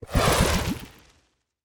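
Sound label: bomb projectile; smite; vulcan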